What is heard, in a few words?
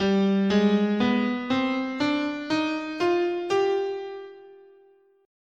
G Phrygian Dominant, scale